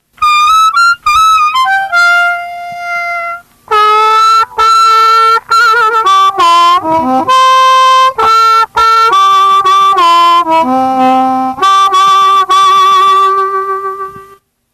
blow bend lick, harmonica

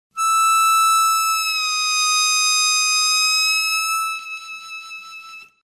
bend, harmonica

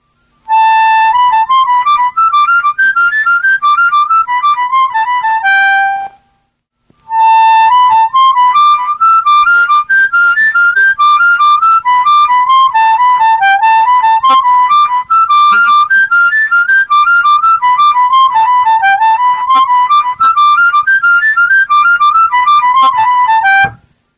sliding, harmonica

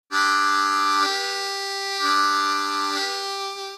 Tongue, harmonica

